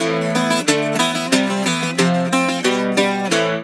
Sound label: Celtic Flavours; USB Soundscan vol.42; AKAI